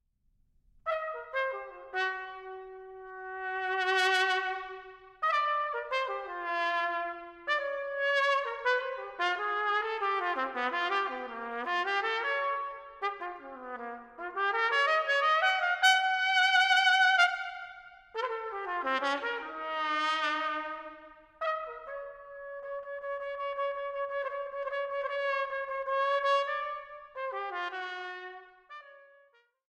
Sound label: One trumpet; Folke Rabe